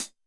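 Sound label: Closed Hi Hat, 505; classic machines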